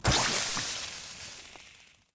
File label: gasattack; stinkfly; steam camp